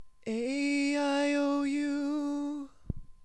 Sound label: aeiou High; A E I O U Sung in the high range